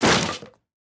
Break Wood Impact; boomerang fu

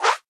Currency Premium Flyoff; Taps to Riches; Android

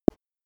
Mellow Tap; Universe Sandbox 2

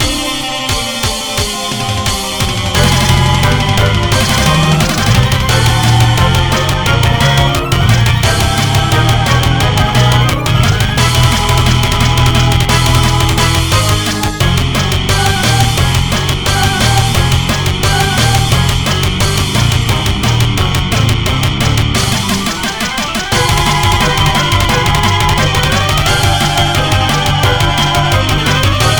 huge robot music; horace ps4 switch windows xbox one gamerip 2019

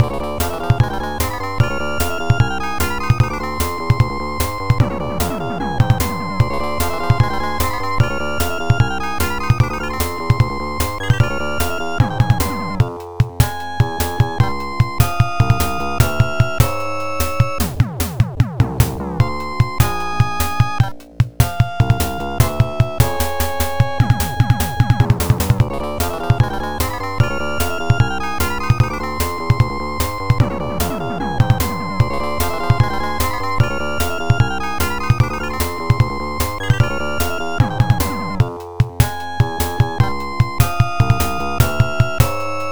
Battle

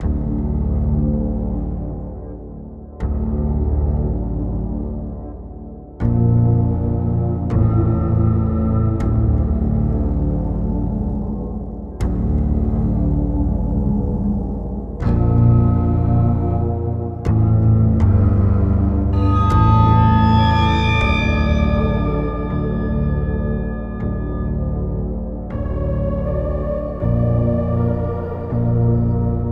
Workshop Music; Five Nights at Freddys; FNAF Special Delivery; android ios

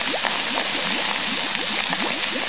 player hit Acid

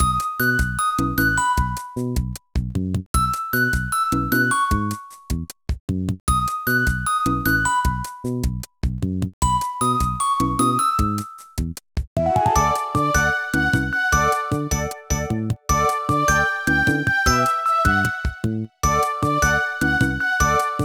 Music Feeding

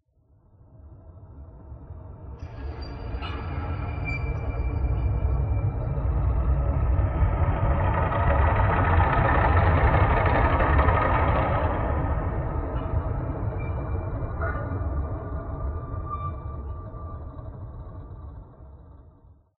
Gritting Sample

